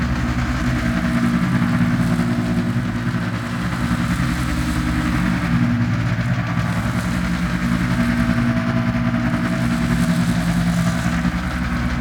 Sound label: Elevator Loop; Ambience; Warframe; Corpus Gas City; Gameplay Remaster